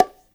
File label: BONGO, Percussion; InVision Interactive; Percussion Slam; BOLERO